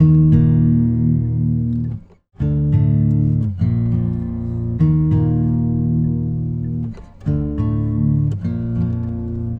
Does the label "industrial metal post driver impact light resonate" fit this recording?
No